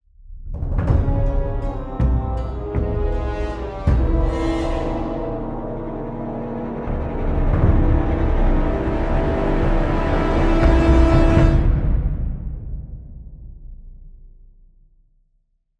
Sting Suspense; Game of Thrones: A Telltale Games Series

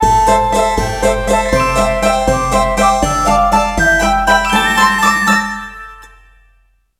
Win Level; lernerfolg vorschule prinzessin lillifee; nds

